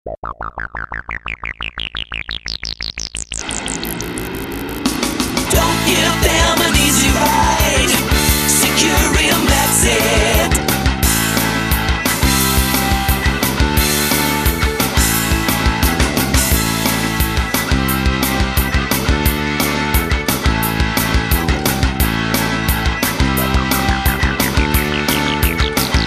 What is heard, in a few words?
Music Bed; Bike Safe Video; CORPORATE MUSIC WRITING